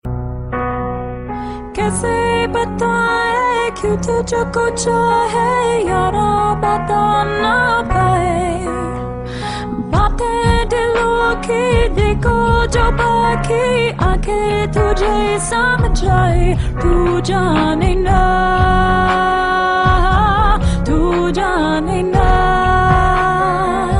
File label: Female